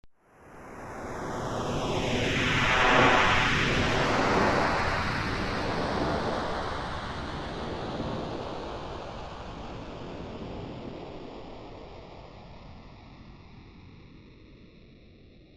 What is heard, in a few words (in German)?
wellen